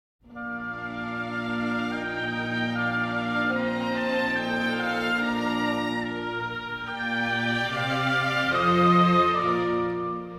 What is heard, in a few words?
Andante from Concerto for Horn; Mozart